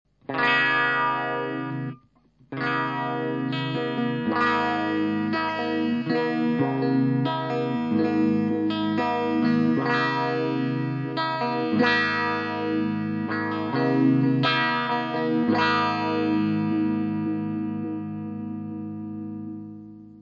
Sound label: meatball; psst